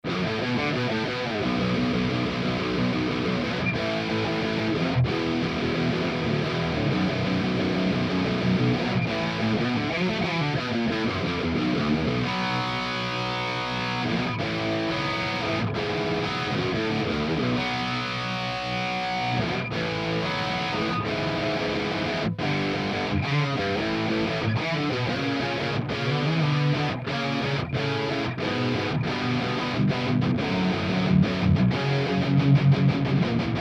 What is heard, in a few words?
MESA QC